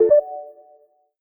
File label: Charger Plugged In; Sony Xperia Z3; ui